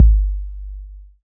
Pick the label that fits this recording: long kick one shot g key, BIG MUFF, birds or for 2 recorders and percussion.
long kick one shot g key